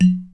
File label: slidebar slider selected; Мини Гольф